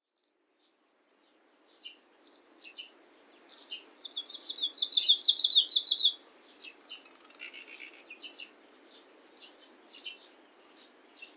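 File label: Kamchatka leaf warbler; oomushikui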